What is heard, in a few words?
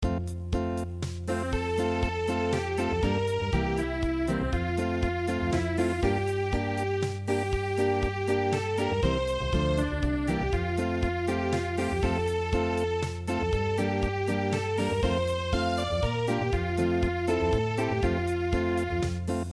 Unplugged; In einem Kuhlen Grunde